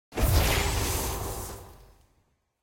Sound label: set complete; eternals eog